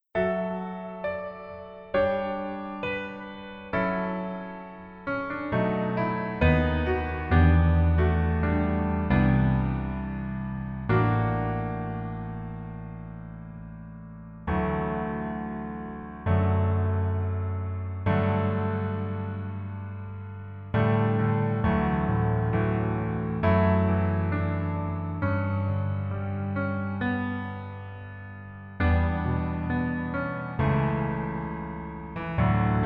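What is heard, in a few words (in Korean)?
Piano version 남자키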